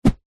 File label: metal crowbar swing in air whoosh; foley